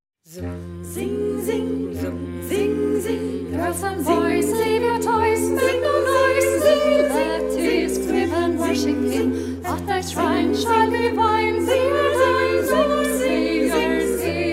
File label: Zither